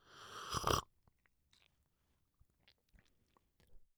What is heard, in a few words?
tea, gaiwan, ceramic, glazed, thick, 3 piece, cup, full, drink, sipping, slurp, swallow; Eiravaein Works; WakeyWakey; mouth clicks